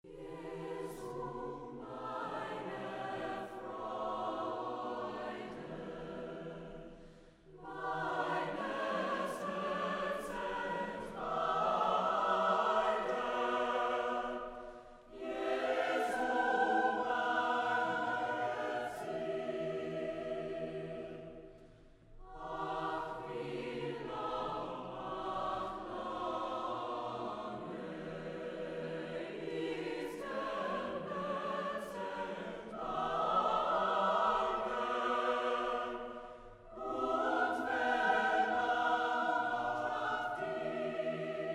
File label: Chorale; Jesu meine Freude BWV 227; JS Bach